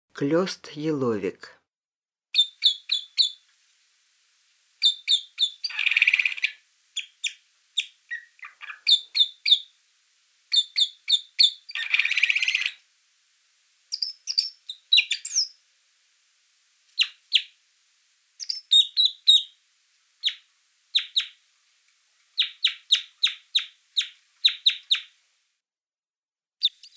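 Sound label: red crossbill voice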